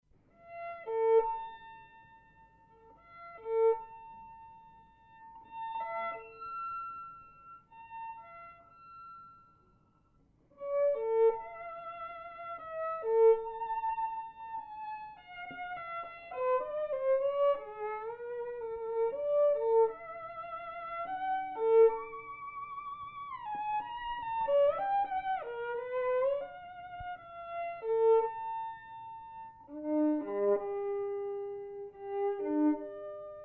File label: Violin Duets